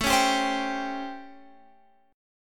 A 7th Sharp 9th, Guitar, Open F; A7#9; strummed

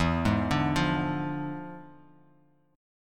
E 7th Flat 9th, chord

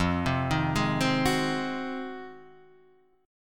F Minor 7th Flat 9th; Fm7-9 Chord {1 3 1 1 1 2} arpeggiated

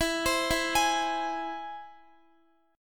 E Augmented E, Guitar; arpeggiated; x,x,14,17,16,15

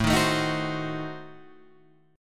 A Diminished 7th A down Guitar Open G

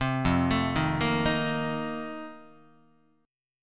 F 11th F, down, Guitar; F11 arpeggiated

